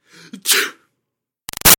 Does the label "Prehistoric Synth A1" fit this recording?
No